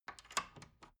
wood door old lock handling short

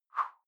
whoosh simple short fast

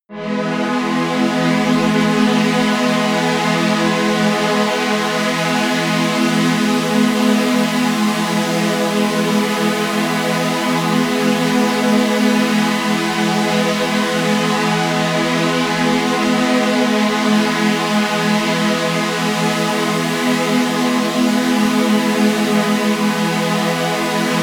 running energy, music elements

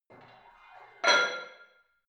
barbell plate put on hit damped reverby soft; sport freizeit; fitnessstudio

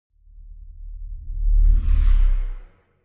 sci-fi vehicle drive by metal soft; raumschiff

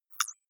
click synthetic light, signale

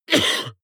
cough male single attenuated soft short; koerpergeraeusche

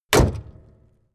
car camaro close hood

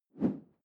whoosh simple short airy; swooshes-swishes

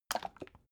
bottle syrup upend glug

